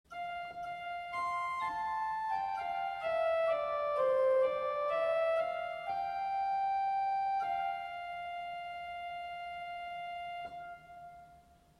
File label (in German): RP Principal 4